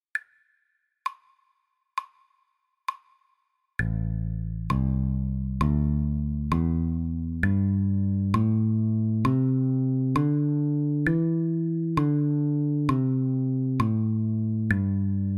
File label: a minor pentatonic bass scale